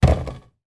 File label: food apple drop onto fruit in bowl; sound effects one